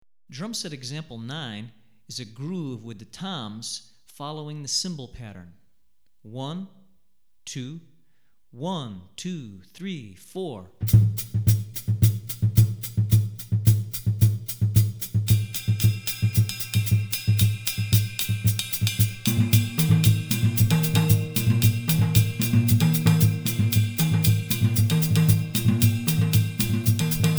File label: Akom, Drumset Example, West African Rhythms For Drumset; Hartigan